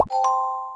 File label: play button; princess